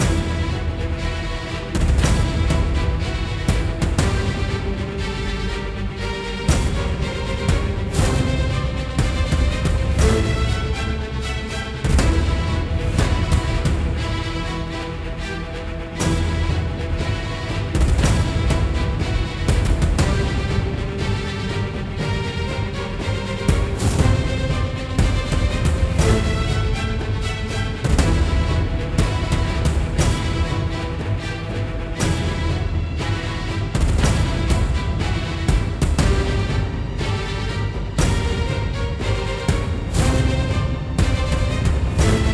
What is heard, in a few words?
Combat Boss Level 2